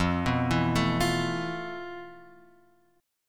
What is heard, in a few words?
F Major 7th Flat 5th, chord; FM7b5 Chord {1 2 2 2 X 1} arpeggiated